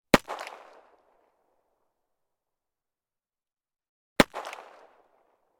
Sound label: gun 1911; weapon; still north media